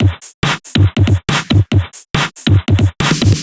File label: Break Beats, 140 BPM; Loops 140 BPM; Vengeance - Essential Clubsounds Vol.4